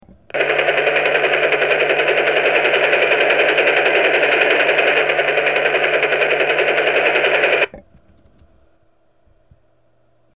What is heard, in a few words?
heli